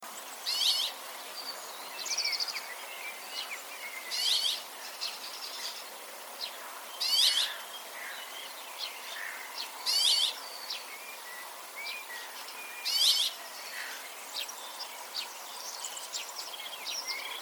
Passera Lagia

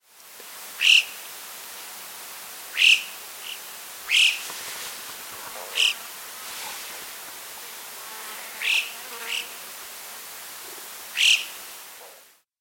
Gracchio Alpini

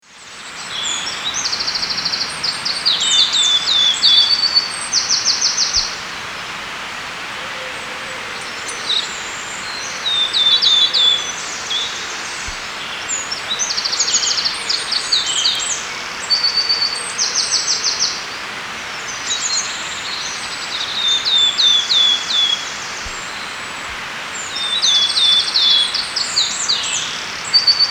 Luì Verde

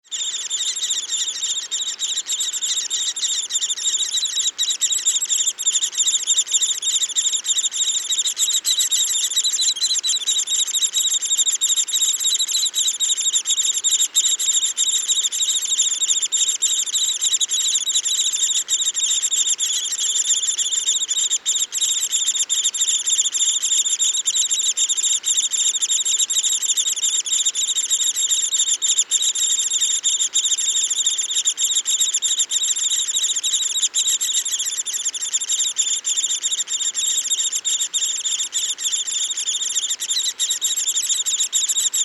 Picchio Rosso Minore

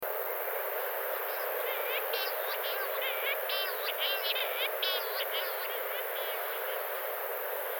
Berta maggiore

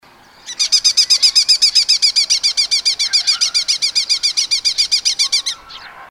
Falco cuculo

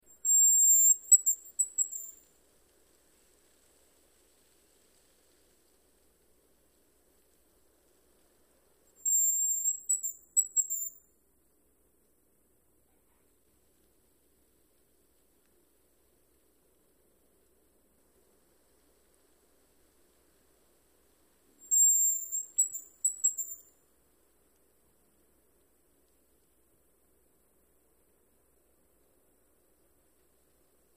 Francolino di monte

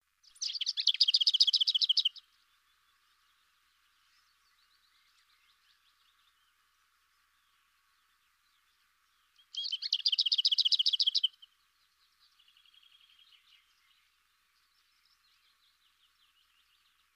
Bigiarella

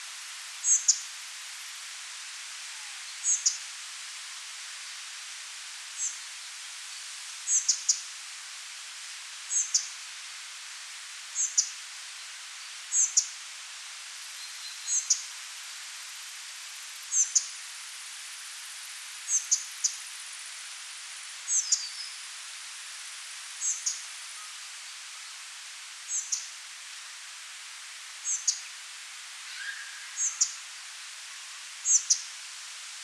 Pigliamosche

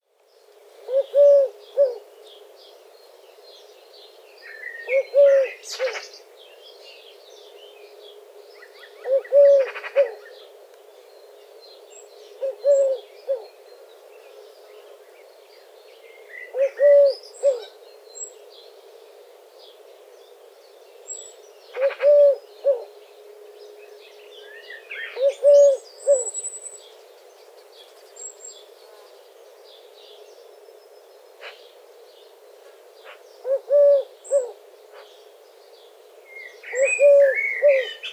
Tortora Dal Collare

